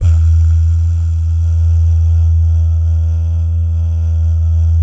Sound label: "bah", Vocal Jazz Fx